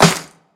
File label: short reverb snare sample E key, snare sample tuned to the E note